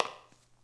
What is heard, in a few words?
lino; ambient